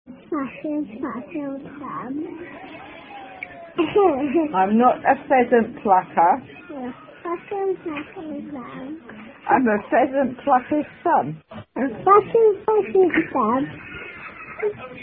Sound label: Pheasant Plucker Kid